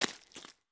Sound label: FS Mud, footsteps